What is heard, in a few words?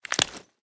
raise first drum end; reloads; gmod